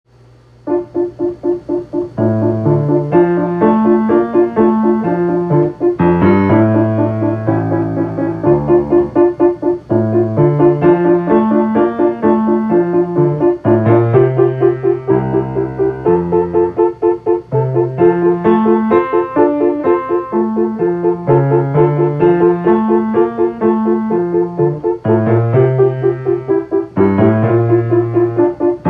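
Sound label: étude in D minor left hand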